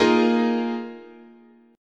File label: A sharp 7th Suspended 4th, guitar chord